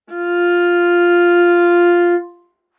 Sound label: Viola arco ff sul G F4; MIS Pitches - 2014; Strings